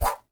Swing Vox Vocal Swipe Stab Swing Jab Motion FX High Pitch; Nova Sound